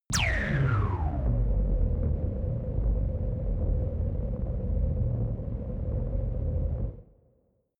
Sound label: space shot wobble low; laser